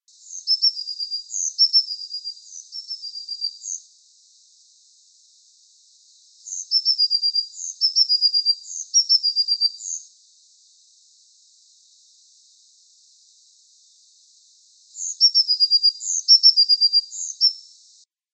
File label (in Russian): obyknovennaya lazorevka golos